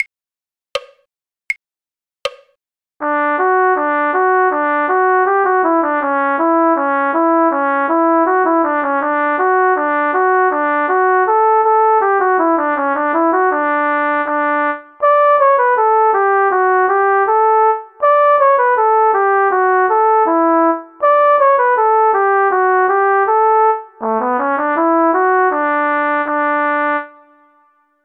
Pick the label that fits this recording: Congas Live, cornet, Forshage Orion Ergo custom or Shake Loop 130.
cornet